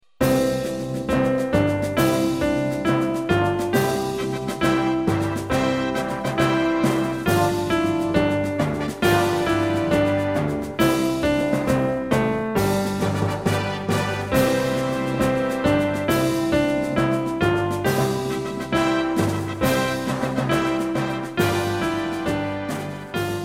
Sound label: Fanfare; General Midi Formats; Off-Staff Starter